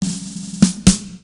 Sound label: Low Achievement